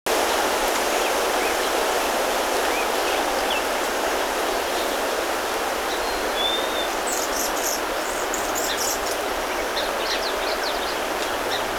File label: Ambience (Forest); donkey kong country returns 3ds wii wii u 2010